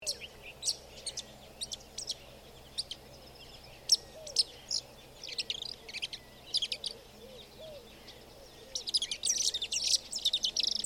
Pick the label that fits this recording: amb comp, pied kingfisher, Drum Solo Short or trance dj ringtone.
pied kingfisher